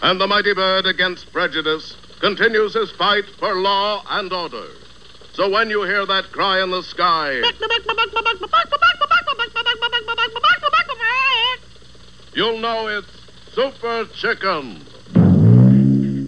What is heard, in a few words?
cry sky